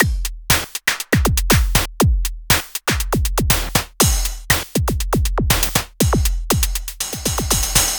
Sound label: SYNTH KIT; Wizoo - Platinum 24 Electronic; DEMO KIT